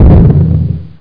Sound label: stomp; Hexen II; eidolon